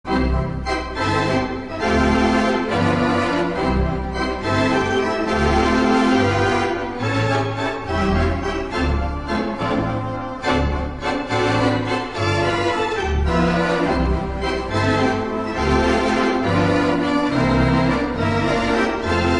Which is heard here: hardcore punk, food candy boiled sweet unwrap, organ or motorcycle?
organ